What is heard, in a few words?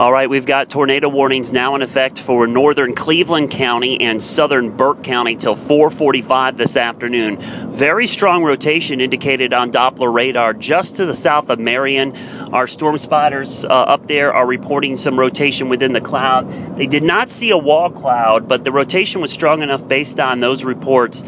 tornado warning